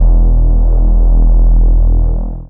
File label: distorted reese bass one shot